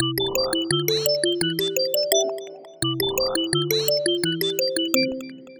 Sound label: Digital Universe